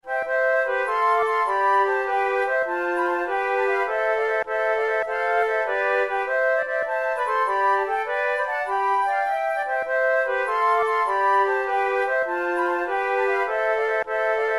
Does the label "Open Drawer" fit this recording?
No